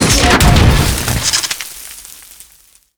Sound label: pull loose